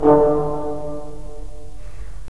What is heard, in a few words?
BRS, Roland LA Composer